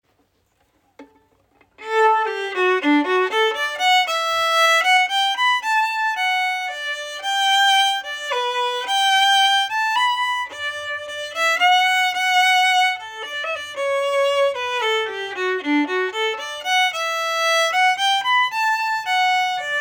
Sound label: sourgrass and granite harmony, fiddle